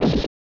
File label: Hit